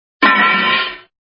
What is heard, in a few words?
Hit Anvil, cartoons